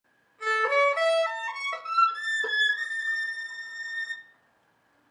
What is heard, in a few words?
Impassioned Riff; Cheryl Violin Project